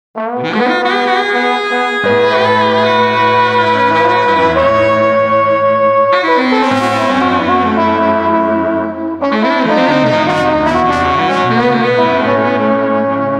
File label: Street Brass Ensemble Lament 95